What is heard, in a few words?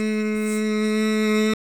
Mmmm